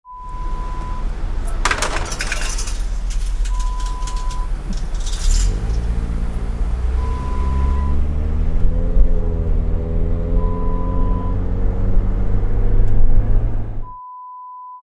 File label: Toll booth sound